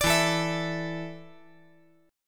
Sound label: E 7th Suspended 4th E down 5G 4C 5E 5A